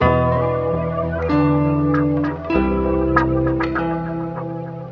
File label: guitar and quaacky keys, Phonky Loops